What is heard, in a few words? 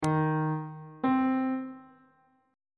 relative minor of d sharp major is b sharp on bass clef